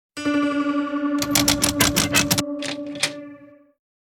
open door; Hanged

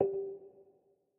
Move G 4 Mf; Mixolumia

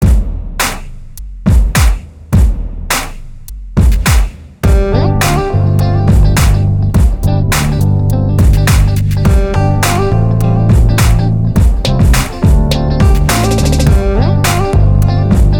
Strings loop mix; Trolls

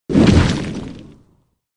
Sfx Enemy Golem Attack; Mighty Knight 2

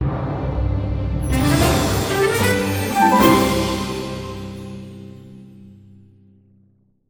music boss defeat

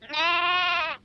Lamb Boy Idle

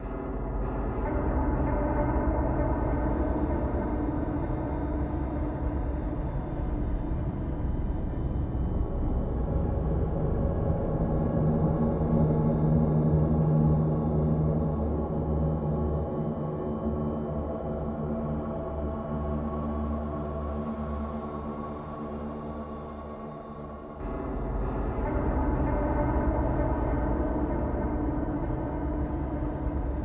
Horror; Music Briefings; Survivor Horror

